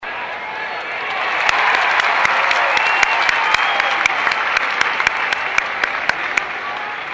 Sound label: More Vigorous Applause